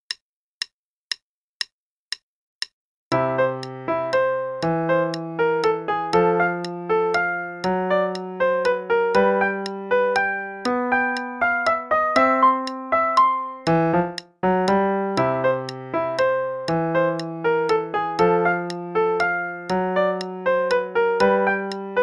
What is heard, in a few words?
Play-along Waltz Time, qn=120; Schmitz